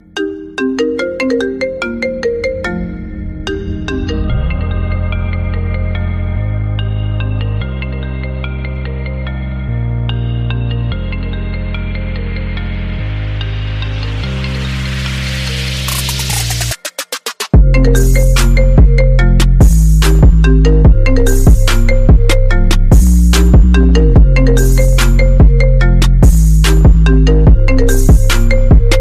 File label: iphone 8 plus ringtone original